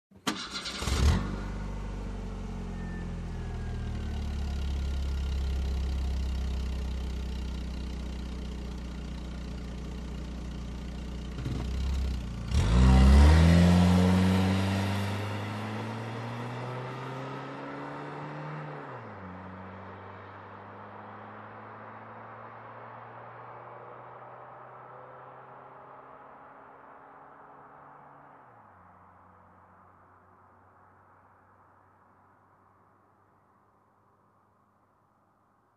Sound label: Car Engine ignition and drive away fast